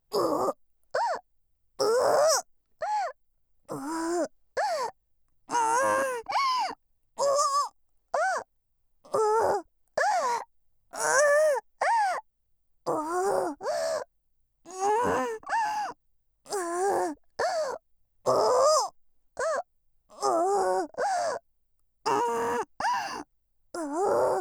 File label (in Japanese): 喘ぎ声(オホ声)